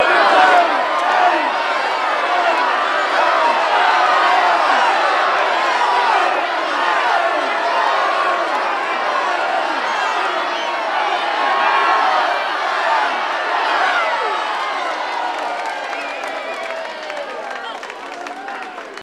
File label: Crowd Cheers Horse Race, Crowd Cheers At Horse Race Track; Race Track Crowd, Austral Downs